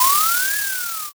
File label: drift